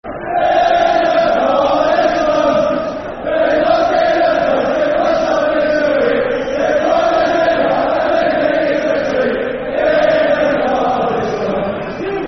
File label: In The Cardiff Slums [Football Chant (Soccer Song)]; Swansea City Scfc